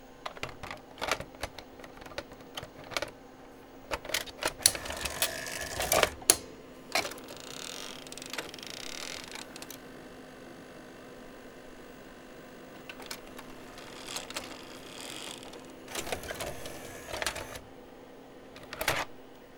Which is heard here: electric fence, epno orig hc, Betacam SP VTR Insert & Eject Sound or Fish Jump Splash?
Betacam SP VTR Insert & Eject Sound